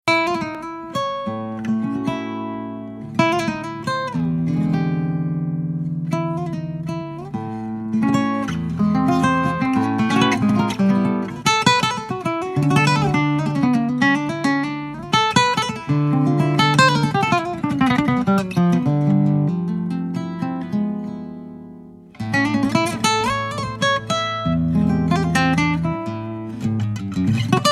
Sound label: Archtop Nylon Studio recording on a Jazz Supreme Grand Cru; by Tilmann Höhn